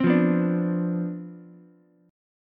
C Major 7th Suspended 2nd Suspended 4th C down Ukulele, chord; CM7sus2sus4 strummed; JGuitar